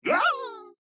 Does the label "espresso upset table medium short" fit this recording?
No